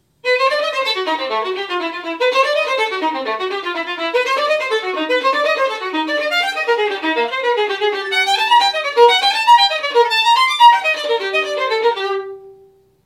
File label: Violin; KMEA Year 4; Schumann; orchestra; audition excerpts